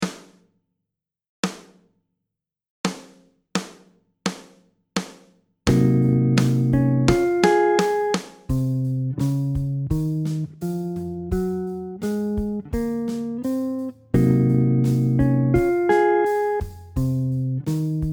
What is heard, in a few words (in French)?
C locrien, guitare